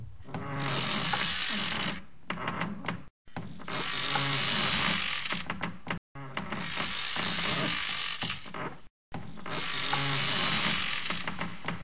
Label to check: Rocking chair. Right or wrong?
Right